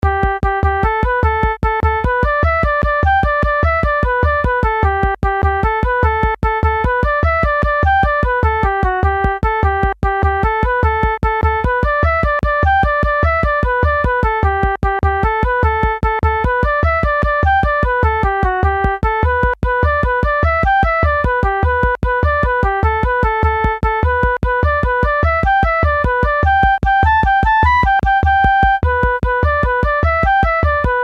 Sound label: Jig with moderate rhythm